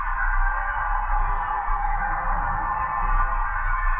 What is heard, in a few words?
Tonal Re Draw, sparse soundscape